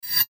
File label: Rollover Airy Whoosh